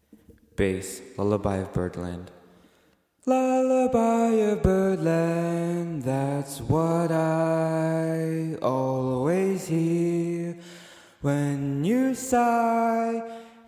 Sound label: bass